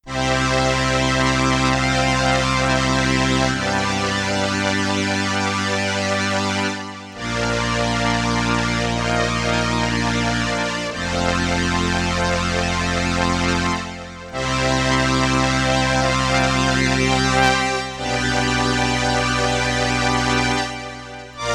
BRASS, STRINGS I, STRINGS II with slow attack, vibrato, sustain, and Ensemble I, Roland RS-202